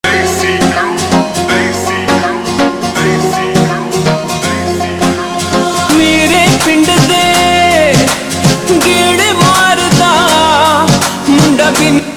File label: Slow Reverb Version Ringtone